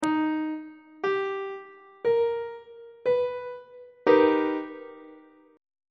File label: c flat augmented major 7th 1st inversion on treble clef